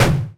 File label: pickup deton; GoldMinerJoe